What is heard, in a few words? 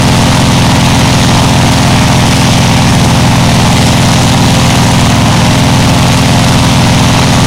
zlin side, engines; War Thunder